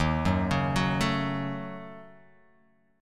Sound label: Eb Minor 7th Flat 5th Eb down Guitar Open F; arpeggiated; chord; JGuitar